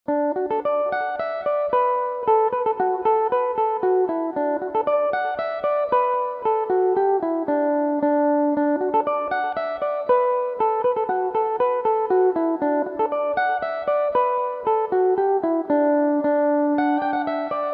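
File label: polka D major, Irish mandolin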